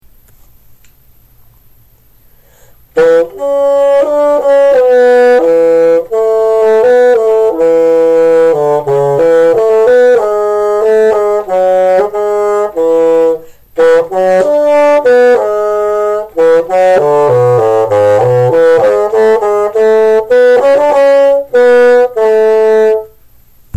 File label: band recording